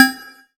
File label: beep